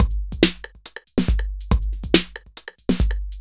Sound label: 808 n 909 w Snare